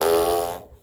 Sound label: fart hoooo